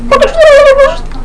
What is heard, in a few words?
turkey